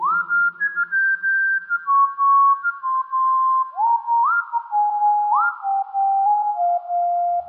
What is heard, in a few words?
whistle sms; Wistle sms